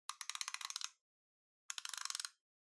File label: Kitchen Timer Wind Up